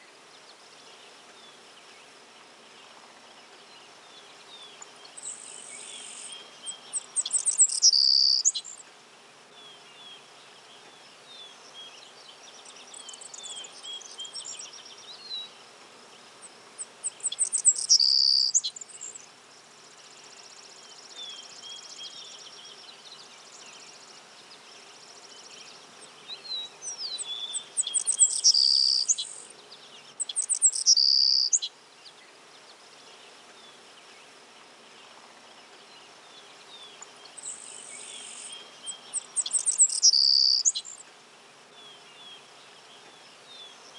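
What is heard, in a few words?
ambience Golf Crowd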